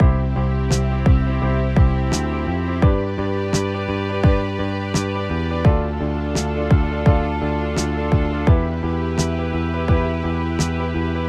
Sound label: Arp